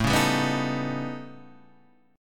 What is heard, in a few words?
A 9th Flat 5th Guitar Half Step Down, chord; A9b5